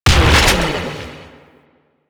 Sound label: Shot, Weapons; Corvas Prime